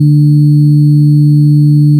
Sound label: additive cello single; cosine